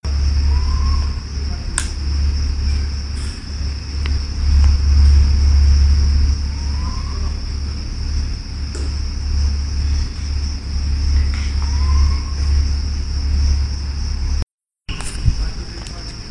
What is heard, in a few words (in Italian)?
civetta